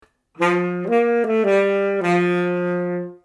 Sax Notification

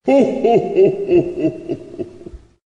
Hollow Laugh

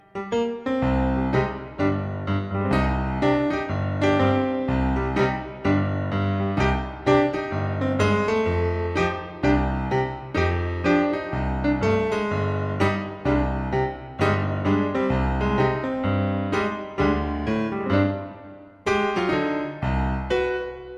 Comic Plodding

